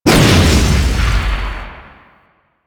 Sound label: Fists Heavy Punch Cast